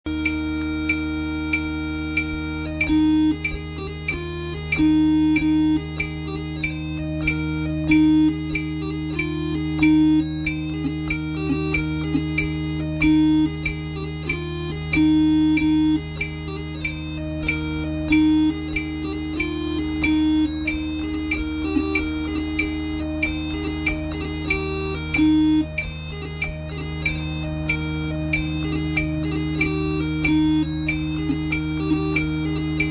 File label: pipers wedding 94 bpm, pipe band; highlanders